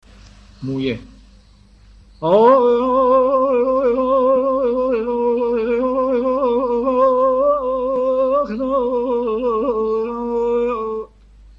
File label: moyeh, seghah